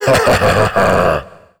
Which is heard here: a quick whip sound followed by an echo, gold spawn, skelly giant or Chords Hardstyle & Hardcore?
skelly giant